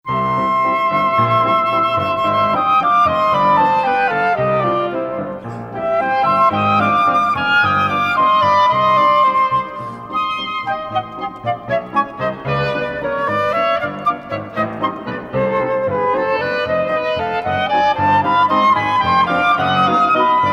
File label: Trio for Flute, Oboe and Piano [Allegro]